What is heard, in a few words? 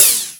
High Hat, E-MU Pro-Cussion